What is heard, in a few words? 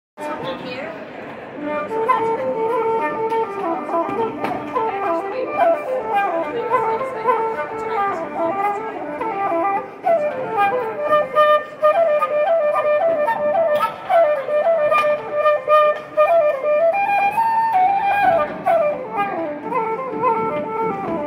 Irish flute; فلوت ایرلندی